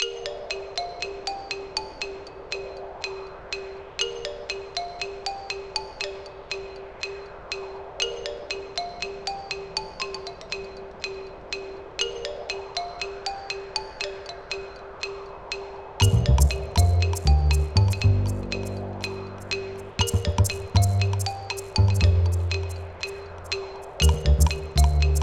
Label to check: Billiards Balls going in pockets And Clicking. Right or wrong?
Wrong